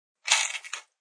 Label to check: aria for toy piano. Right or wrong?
Wrong